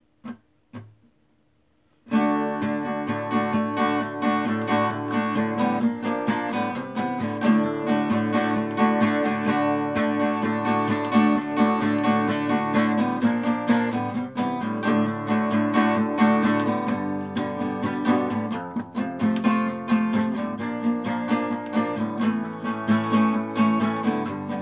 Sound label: acoustic; brick and mortar; la panga